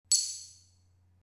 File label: nfc read